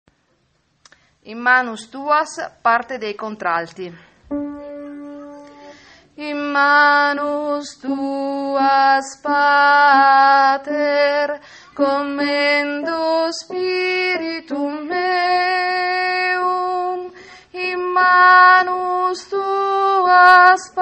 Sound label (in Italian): in manus tuas pater contralti